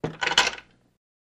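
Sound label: Chain Movement Click; chains handcuffs & shackles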